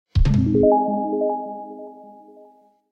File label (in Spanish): alerta digital